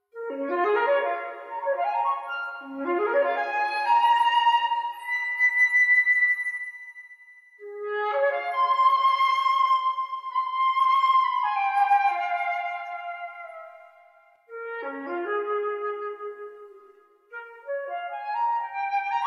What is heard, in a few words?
Arcangelo flute